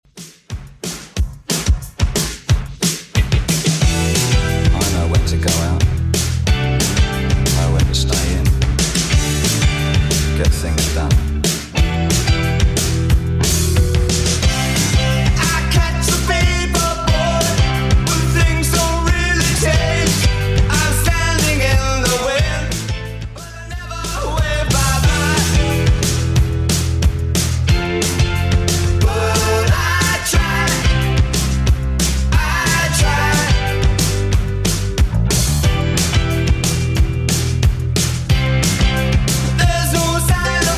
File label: 80s Redrum 181bpm